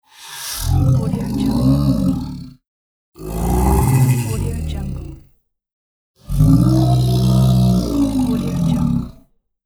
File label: pleased creature growls